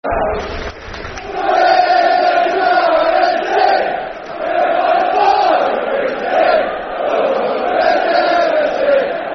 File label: Swindon Town Fc, Football Chant (Soccer Songs); Swindon Town Stfc